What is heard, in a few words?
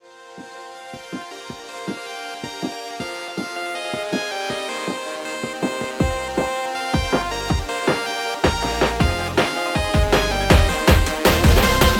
intro, music; rumble revolution; hero-mark-2